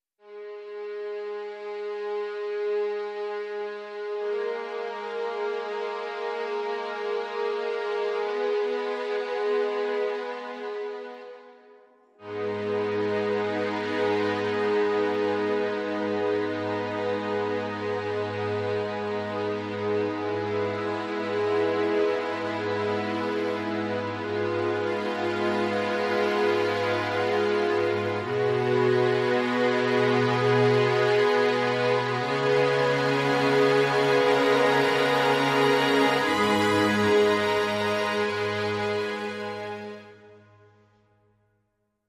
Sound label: Strings Only